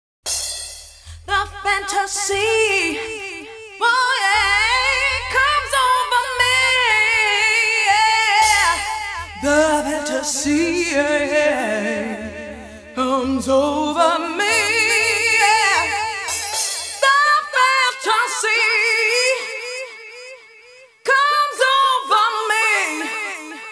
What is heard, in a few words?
accapella